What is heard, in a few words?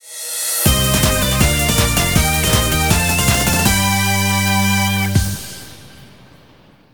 music win